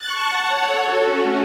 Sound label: Battle Intro; Voidspire Tactics